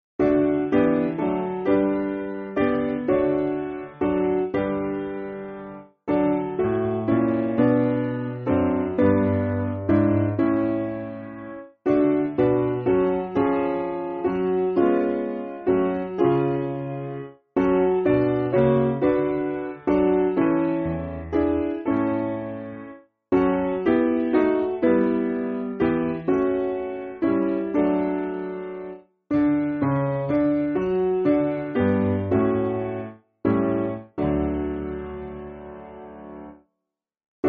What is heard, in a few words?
Piano, Music Backing Files for Churches